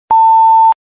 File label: ding